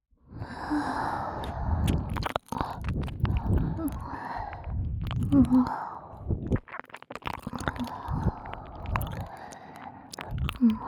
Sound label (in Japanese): レロレロ耳舐め両耳; 耳舐めコレクション